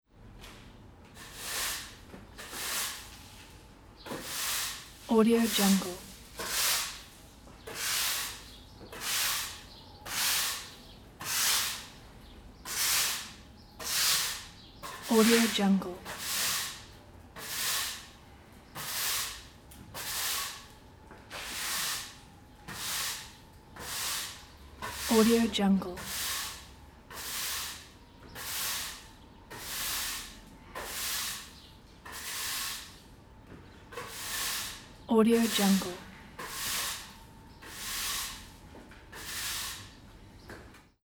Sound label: sweeping with broom in garage; mountainaudio